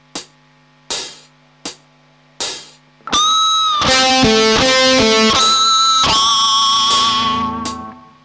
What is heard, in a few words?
pinch harmonics; advanced techniques